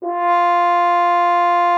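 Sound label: French horn F4; Xsample VOL-3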